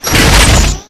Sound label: Flak Cannon Open; weapons; Requests Studio; UT3